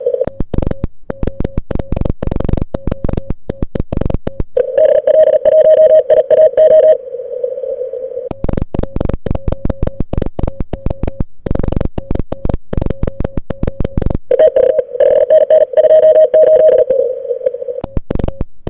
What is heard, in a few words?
cw; IARU HF - 40M CW at OL5Q